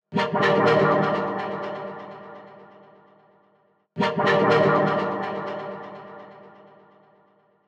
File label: Synth Loop 125 bpm Gmin; Unit Rcrds